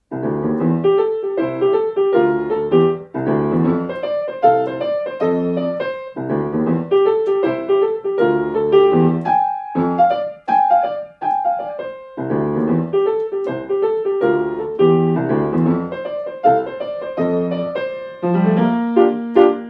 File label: dixieland